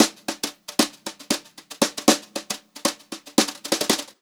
Brushed Drum Loops, JAZZY

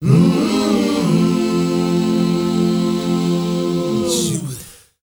HUMMR, MMM CHRDS

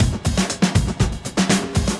breaks, 120bpm; free bespoke breaks; BeatStyleG

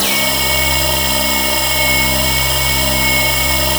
ACTIVATE; Spectrasonic Distorted Reality 1

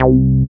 SYN TB303, Roland; Sound & Vision - Gigapack I CD 2

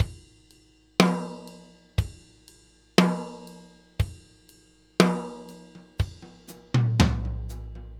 New York Loop, Drum Tools, Laidback

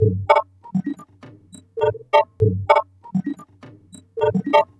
Tubulation Beat, Electronic Beats; Sonic Foundry (Sony Creative Software); Myths of Technology

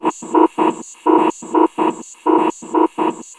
CYBORG II, SF EFFECTS; Q Up Arts; Dream Experience

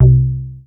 C3, BASS; Q Up Arts; Dream Experience; BASS BANK 7

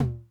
Tom Mid Accent Mid Tone Mid Decay, 909

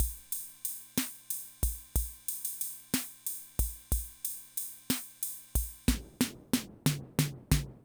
Loop, rhythm 55, classic machines

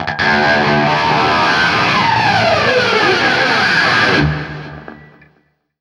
scrape, guitar; East West; Steve Stevens Guitar Samples Collection

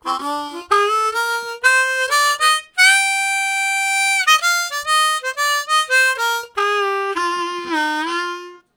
Blues Ladder, Harmonica; Zero G

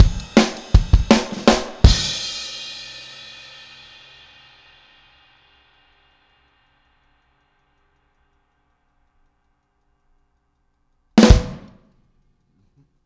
Outro Full Mix, Drums 162 BPM; Drums from the Big Room The Mixes; Take Your Toms Off

